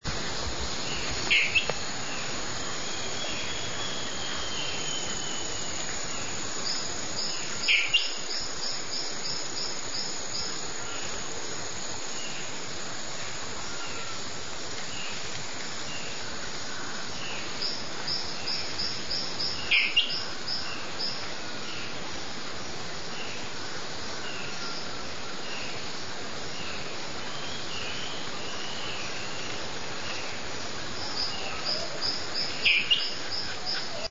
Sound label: dwarf tyrant manakin; Peru